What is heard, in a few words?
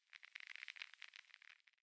Crackle; Pitch Black - A Detective's Demise